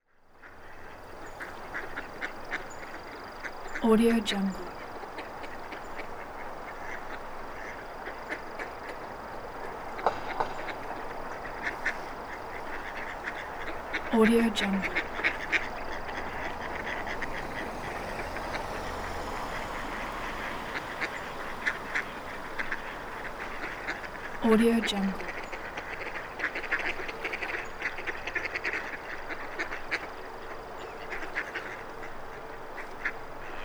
ducks from a city park

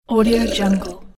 slurp

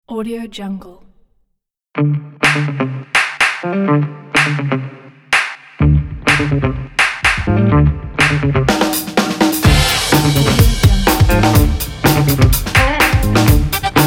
in funk

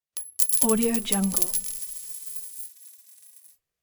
coin drop; kipaloops

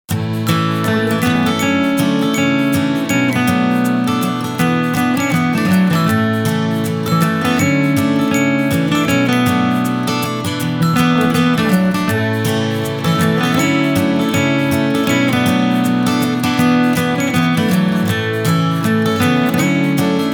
happy folk; simonreidmusic

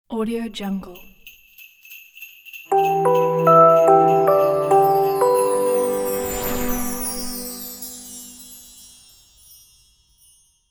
xmas intro

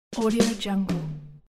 drum fill

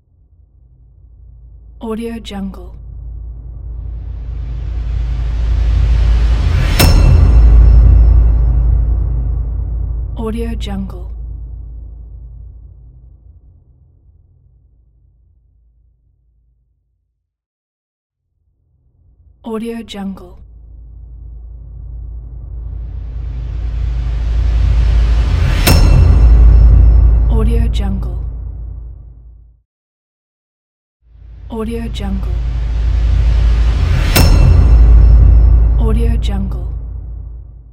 cinematic rise hit

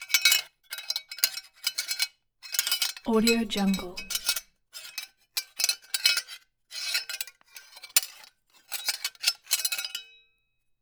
mechanism metal rub coupler; mechanism source; soundmorph

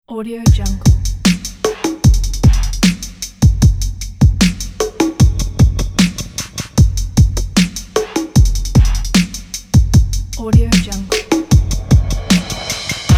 dark hip hop drums

